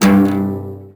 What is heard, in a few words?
Cello Hit String Snap; SFX Kit; Foley - Misc